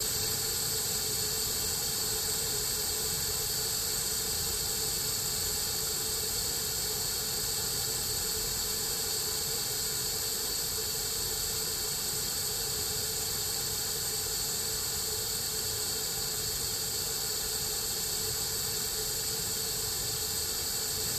Gas Furnace Constant Hiss, Basement Furnace

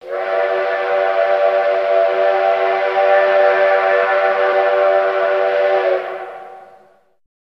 Steam Train Whistle, horns

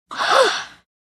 3 Three Kids Gasp

